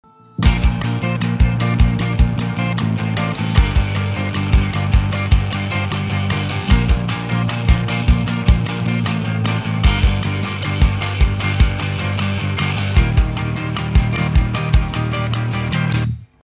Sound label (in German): instrumental Refrain ruhig